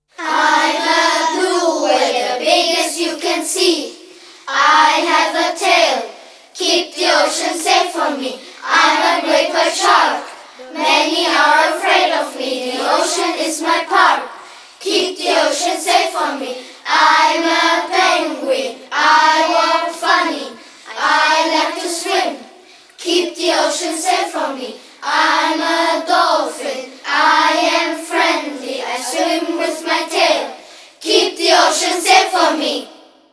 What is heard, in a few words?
Ocean Friends Chant; englisch; schulstufe 3; kringlmingl english 3